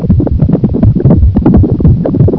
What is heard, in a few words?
lava